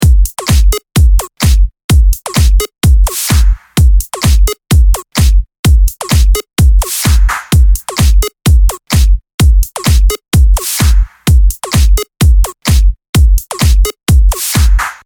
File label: electro house loop